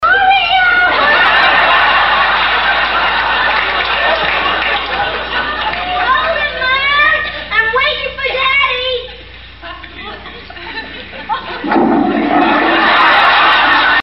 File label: toilet